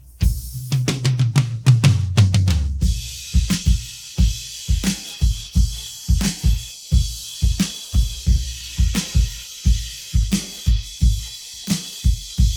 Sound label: pattern, drums